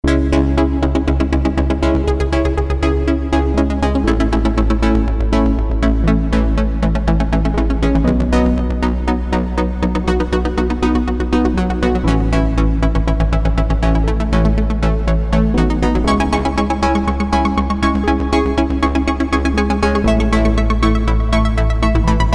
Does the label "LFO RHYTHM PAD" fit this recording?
Yes